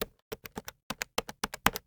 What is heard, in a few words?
Switchboard Telephone Dial; Communications; 1001 Sound Effects